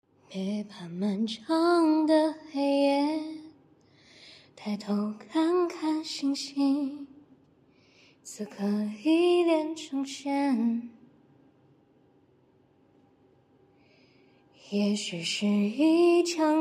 歌曲

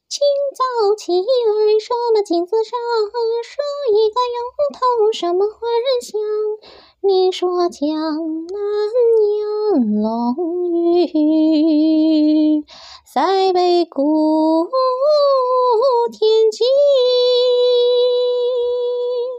戏腔

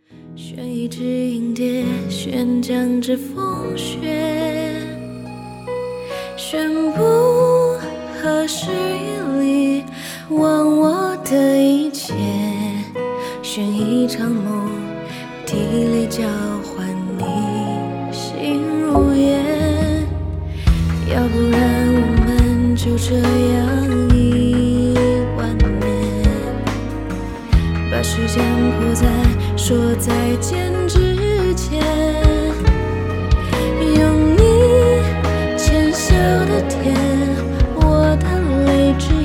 翻唱

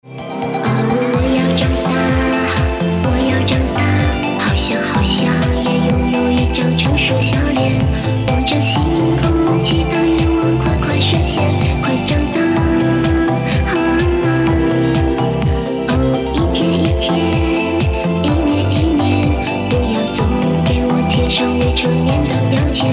青蛙演唱; 成长日记青蛙演唱

